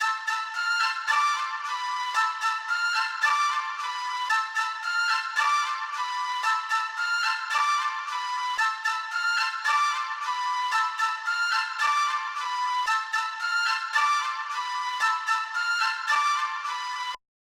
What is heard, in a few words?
112 BPM A Min Flute; Cymatics - 2022 Melody USB Expansion; Blue Pt 2